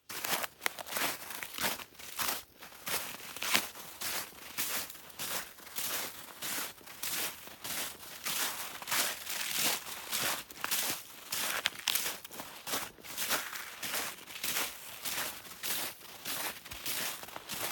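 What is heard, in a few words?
snow foot, footsteps in snow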